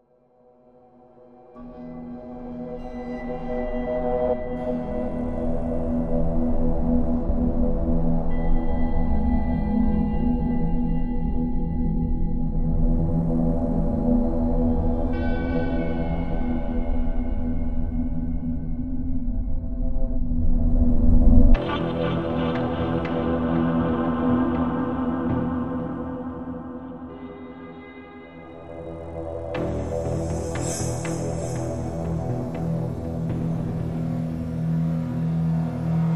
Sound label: Dark Drone, No Hits